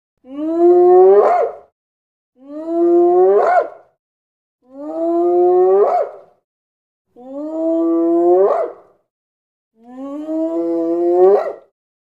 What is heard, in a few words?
Hyena Spotted Barks